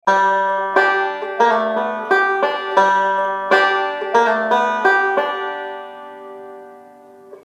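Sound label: lick 3-2 pull-off variation, banjo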